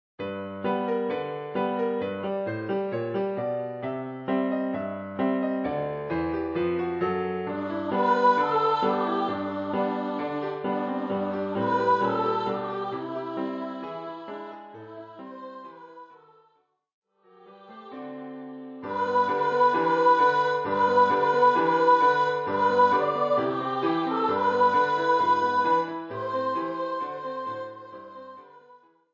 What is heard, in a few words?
Jingle Bells